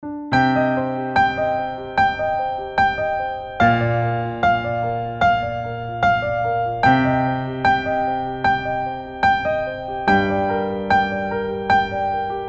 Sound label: Jingle